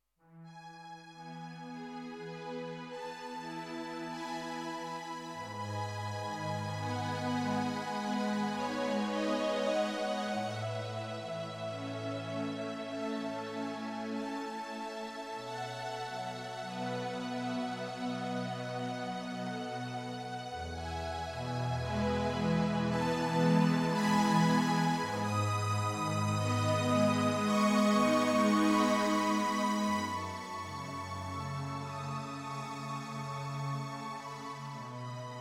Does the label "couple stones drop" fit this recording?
No